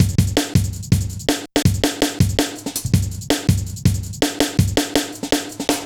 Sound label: Heavy Live B, Drum Loops, Jungle; Zero-G Jungle Warfare 1, 2 & 3